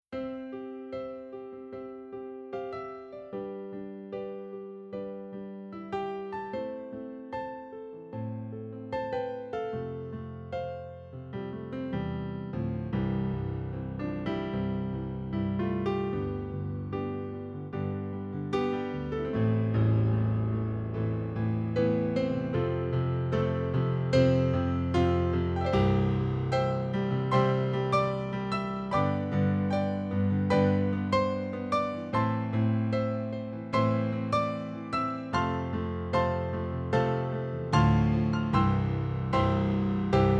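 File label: Grand Piano Mellow; digital pianos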